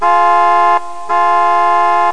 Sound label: horn